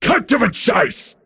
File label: attack pain; pudge; arc